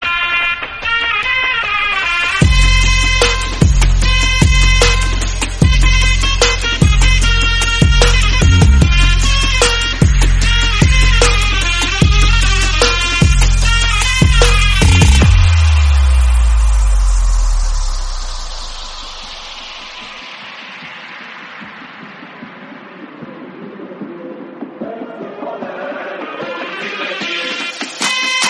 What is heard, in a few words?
mehter marsi remix ringtone